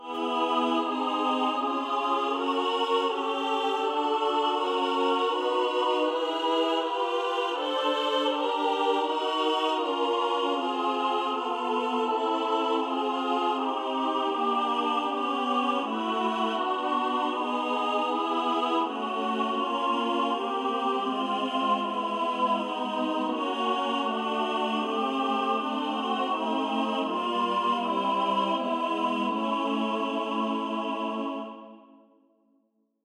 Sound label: 12 tone alleluia